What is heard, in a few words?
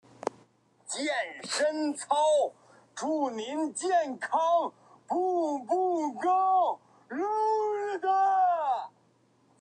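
man scream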